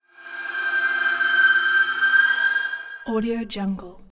asylum shriek sweep drone; esmteam

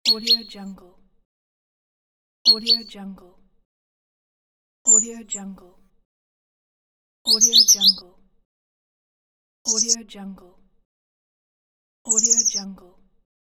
birds; wildemotion

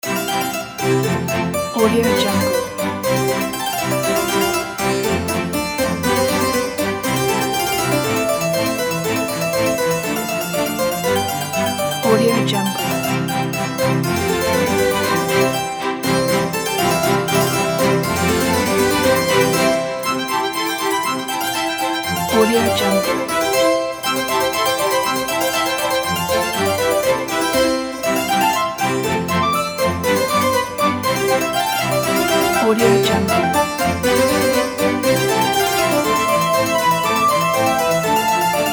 baroque harpsichord

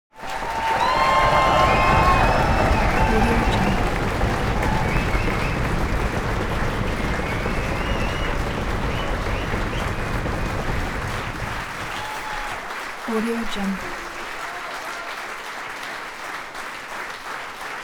human crowd applause medium audience aula back; smartsoundfx

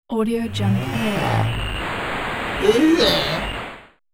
zombie invasion intercom noise

